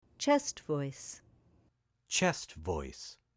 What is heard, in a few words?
Chest Voice